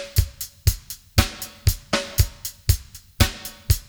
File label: beat